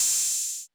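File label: Open Hi-Hat 808 Saturated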